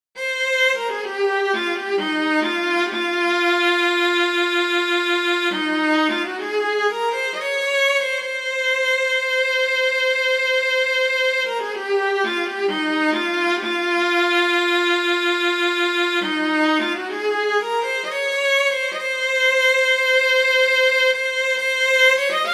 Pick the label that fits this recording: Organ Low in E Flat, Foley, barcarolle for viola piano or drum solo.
barcarolle for viola piano